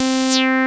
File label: fat fuzzy; Pro-Rec - Synthsonic